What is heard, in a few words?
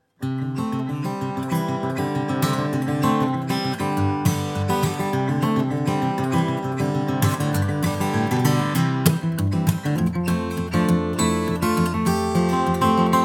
Single 0 sample, guitar